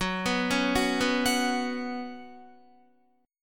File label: Gb Suspended 4th Gb, down, Guitar; Gbsus4 arpeggiated